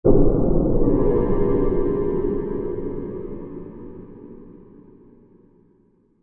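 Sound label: Music Eerie Low Metal, pads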